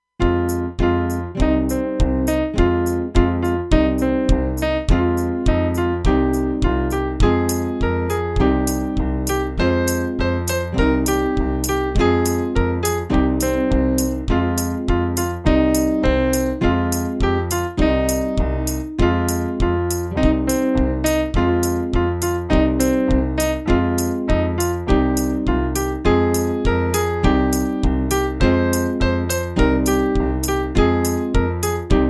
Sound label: Karaoke Version